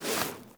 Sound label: Walk Movement Foley; Footsteps